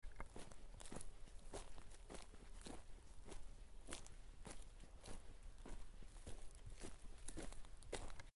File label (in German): schritte